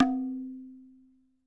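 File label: TABLA right; WorldSounds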